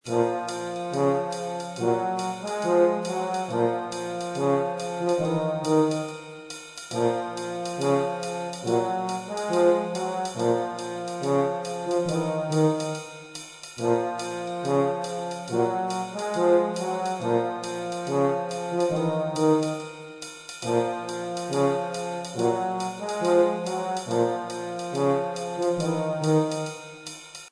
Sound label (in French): trombone tuba voix